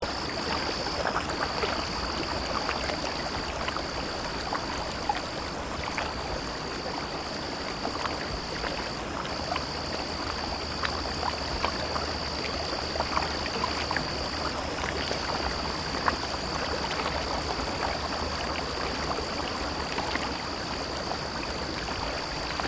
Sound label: Ambient Pirates Night; magic orbz; ios ps3